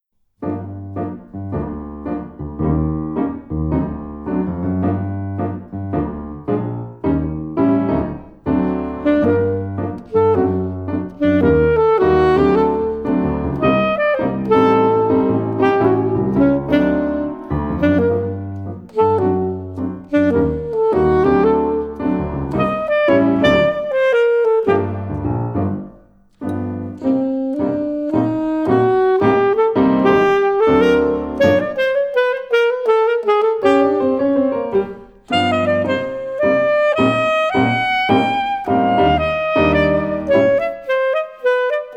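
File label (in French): saxophone et piano; Escapade musicale saxophone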